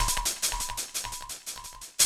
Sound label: Beat, Futurist Drum & Bass; Drums & Percussion